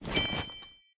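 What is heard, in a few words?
mission complete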